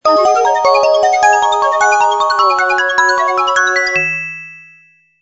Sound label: Ringtone; W510 Twiggy